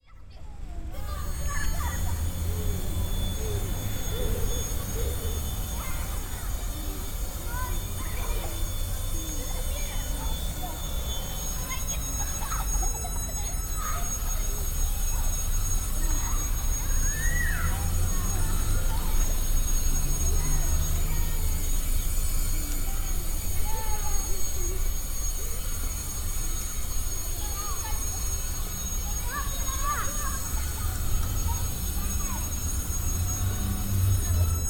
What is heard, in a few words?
carousel, sergiyev posad